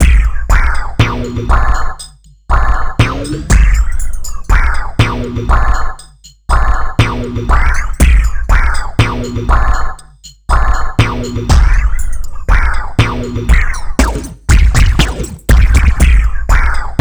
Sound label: TRIPHOP; INIS; Funky Xtreams